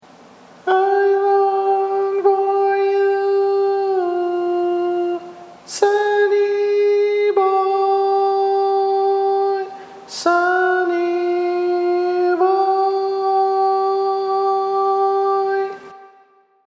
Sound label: Tenor